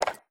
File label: general up down movement, user interface; gmod; mw